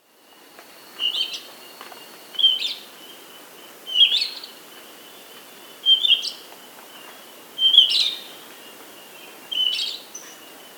Glossy-Black Thrush (Turdus Serranus), Andean Birds; S. America